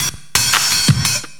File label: loop, Drum & Bass, 170 BPM